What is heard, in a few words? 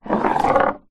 object drag on polished wooden floor; foley